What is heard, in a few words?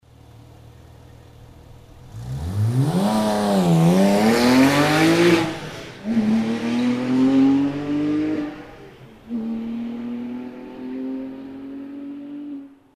997 gt3 rs sound; Porsche 997 GT3 / RS; (2006); Départ